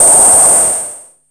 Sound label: WN FM HIT, ANALOG PERC; Zero-G Ambient 1